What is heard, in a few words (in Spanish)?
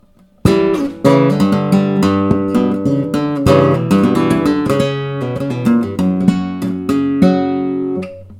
falseta